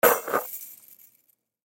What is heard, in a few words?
Basketball Swish Chain Net Rattle; SPORTS; Basketball (Sound Effects:Sports); Blastwave